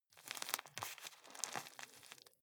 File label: juggle squeeze